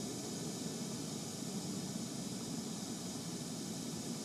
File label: Ambience Loop, outdoor